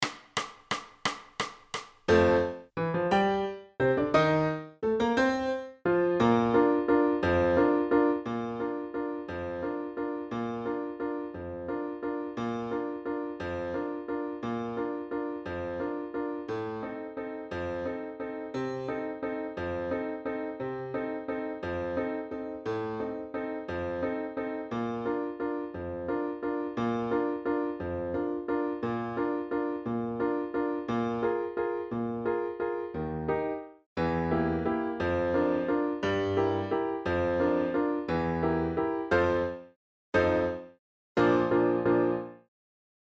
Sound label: Flute and Piano backing; strauss; Voices of Spring